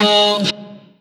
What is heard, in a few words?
Vocal one shot Ab; Slate Digital Omnivox Vocal Sample Pack